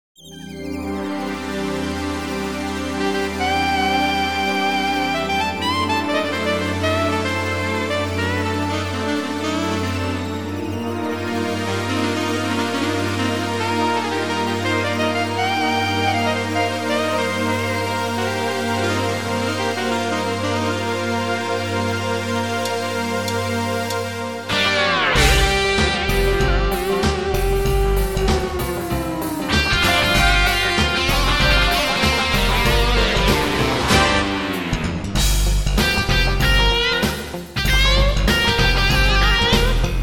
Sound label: Hyper Groove Rock And Pop; Idecs; Hyper Groove 33